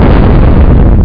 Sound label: Destroy Block; Giza